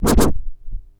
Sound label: ST SCRCH, SCRATCH; AKAI S-Series CD-ROM Sound Library VOL-4